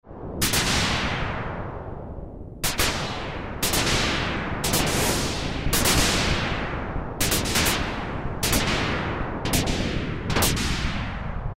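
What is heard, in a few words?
Echo Explosion, Roland Alpha Juno-2; Factory B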